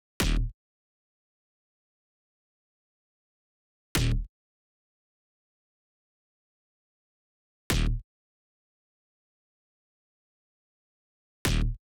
128 BPM D# Min Bass; House; Drop Loops; Loop Stems; Cymatics

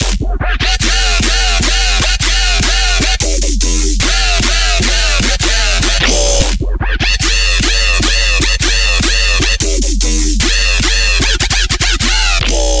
Drop Loop - 150 BPM Fmin, Dubstep, Bass Loops; Synths; Raptor; Cymatics; Dubstep Starter Pack

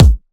Riddim Kick; One-Shots; Ghosthack; Riddim Essentials Volume 2

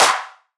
Riddim Clap

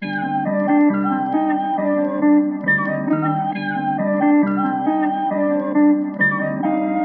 136 BPM C Min, Trap Melodies; Animal; Cymatics; Trap Starter Pack

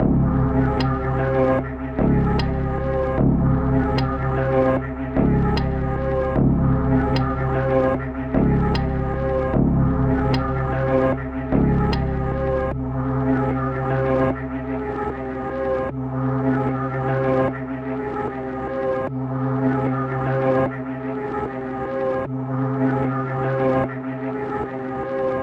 151 BPM G Min, Hip Hop Melodies, Reverse; Bone To Pick

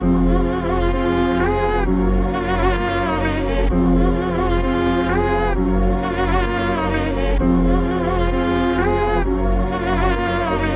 130 BPM F Min, Trap Melody Loops; Painful Dream; Cymatics; Nightmares Trap Melody Loops

